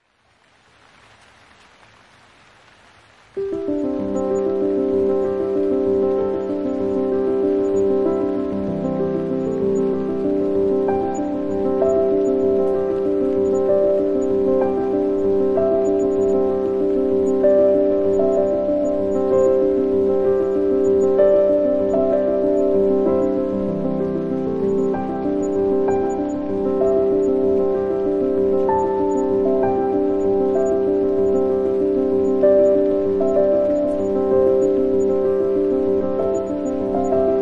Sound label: River Flows (With Rain), Calming Piano Music with Rain